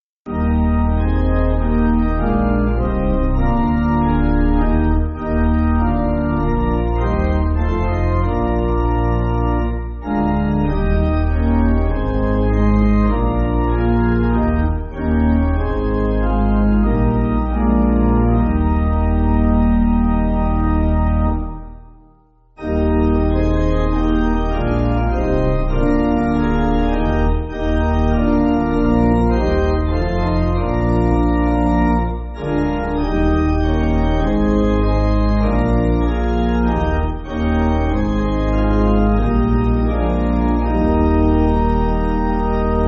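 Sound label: Pipe Organ, church backing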